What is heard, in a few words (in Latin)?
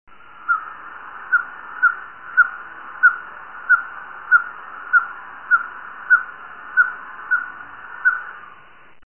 glaucidium californicum